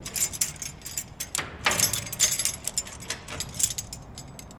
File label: Key Mvmt Jail Door; jail & prison doors